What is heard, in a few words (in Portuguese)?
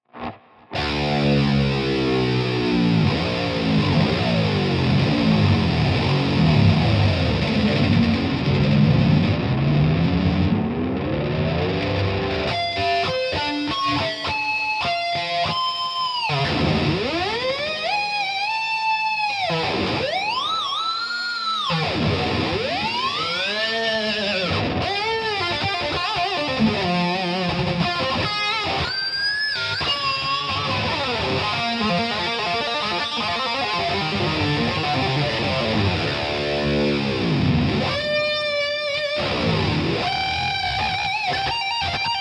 teste de timbre line pod x3